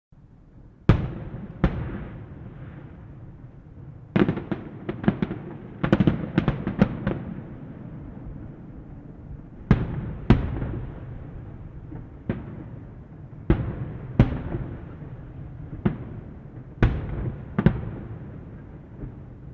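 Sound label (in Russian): далекий большой с эхом; звук салюта или звук фейерверка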